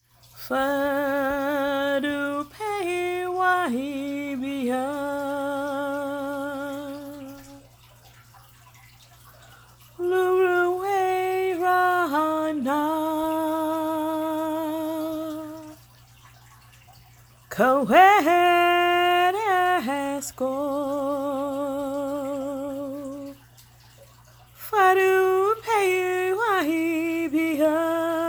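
Chant, Here is a recording of chanting the MAWAB Light Language Chant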